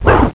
swing

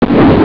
kiai

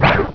swing

